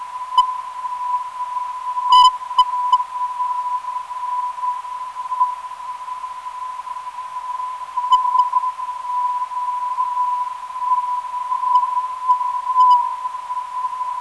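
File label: non-directional beacon; OKN; 1720kHz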